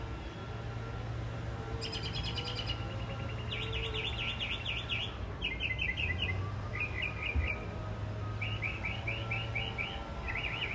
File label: Front Porch, birds